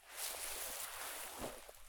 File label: prone grass low; Escape from Tarkov